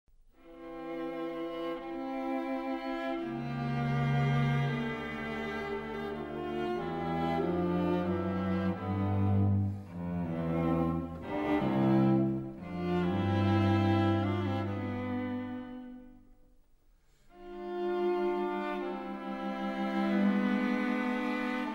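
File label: string trio; eybler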